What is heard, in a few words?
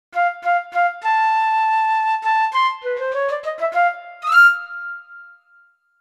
flute; kontakt player; sibelius